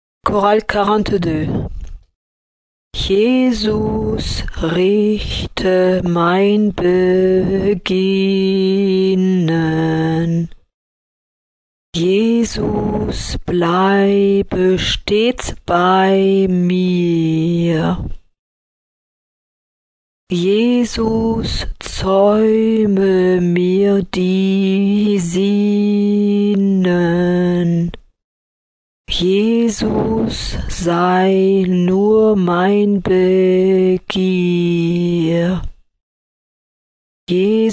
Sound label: choral; partitions chorales